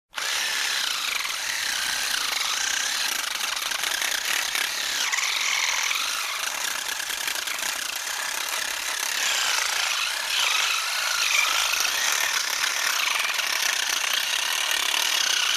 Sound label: Lawn Mower cutting grasses